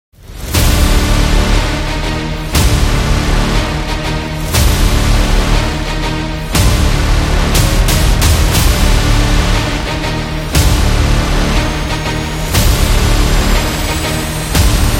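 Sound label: Warfare, epic cinematic trailer music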